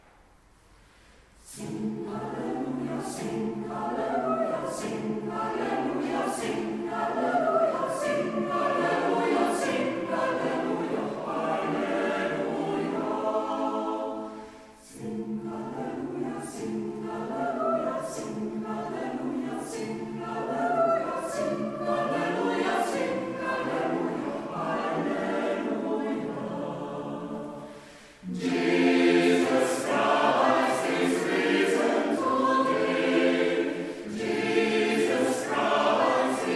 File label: Young Sing Alleluia